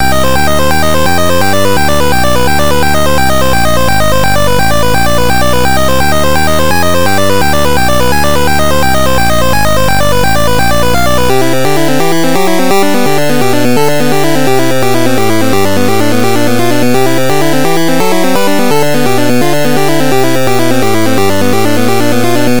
8 Bit

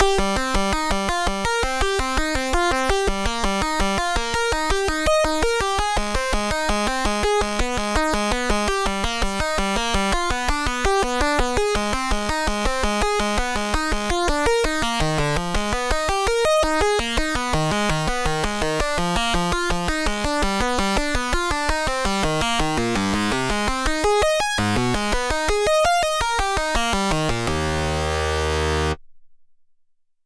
Teslacoil Synth

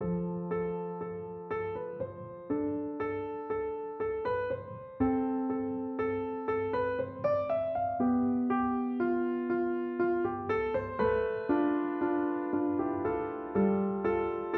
Triad Etude

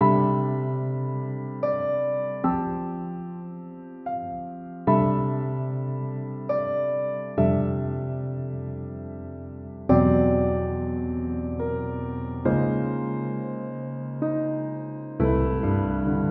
Etude in 5ths

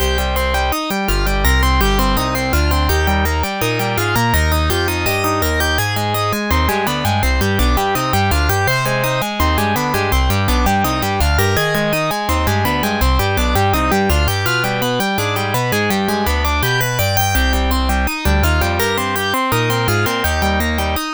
Synth

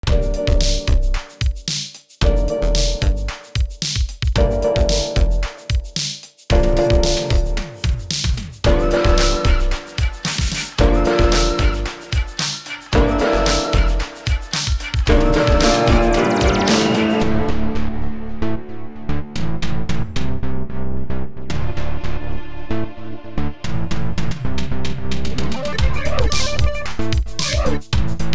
instrumental

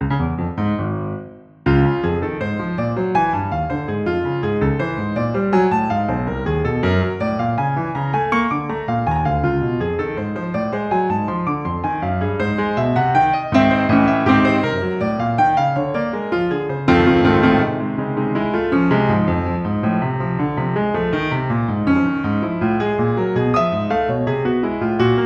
Blues Etude

